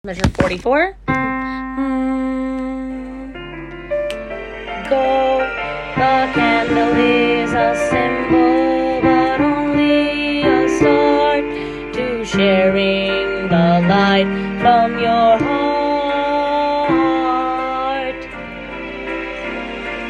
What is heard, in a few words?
bass